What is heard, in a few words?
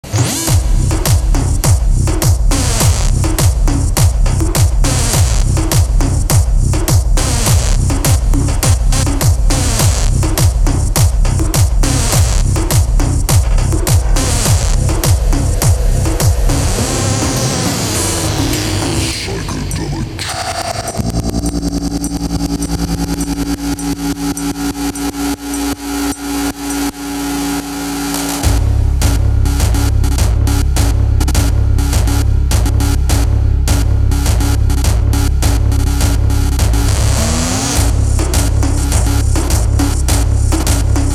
techno